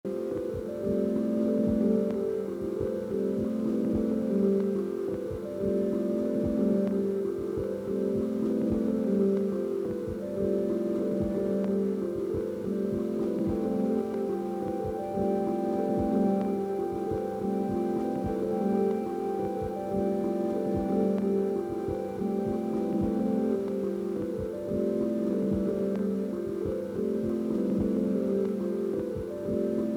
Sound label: prophet tape loop